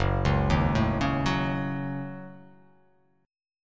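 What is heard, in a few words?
Gb Minor 6th Gb, 8 string; arpeggiated; JGuitar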